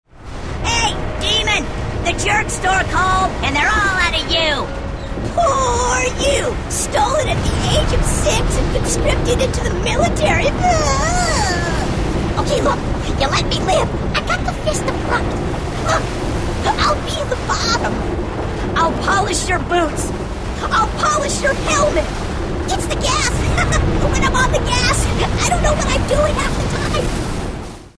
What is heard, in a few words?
Grunt